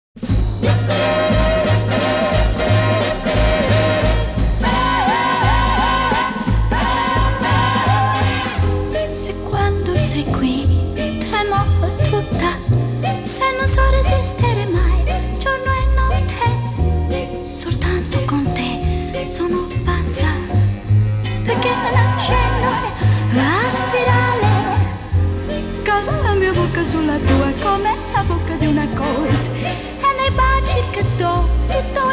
italian version

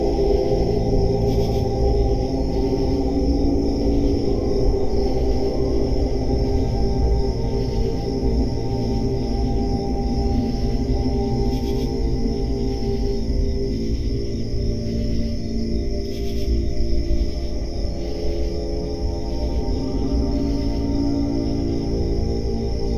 crickets at night and om mantra chant

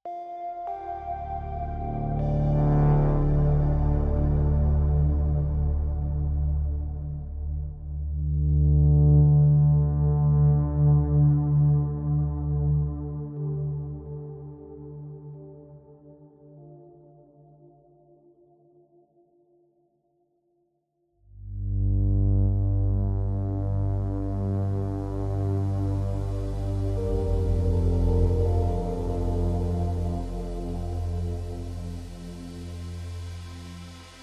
ambient

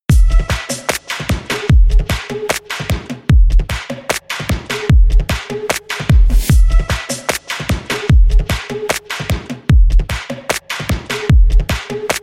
remix

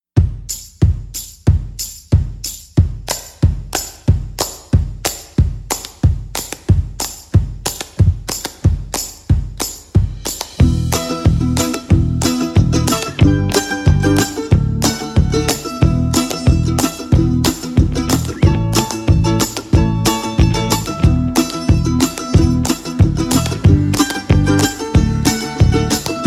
no lead guitar

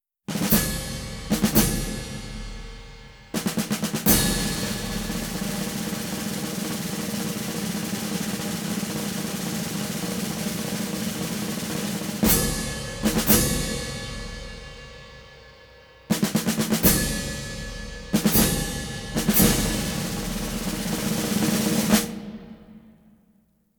wild percussion

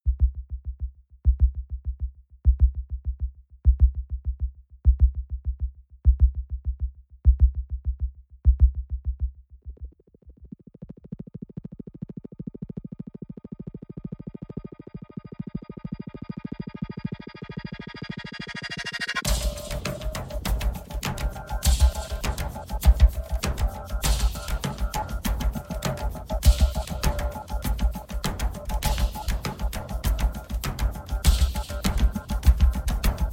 perc only

